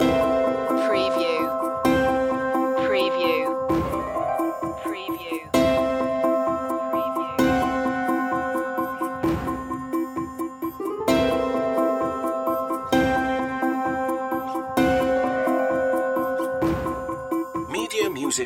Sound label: the quirky traveller loop